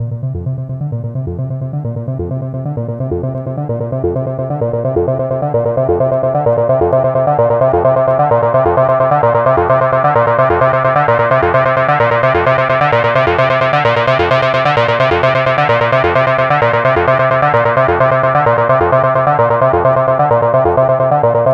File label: NRG Sweep, Trance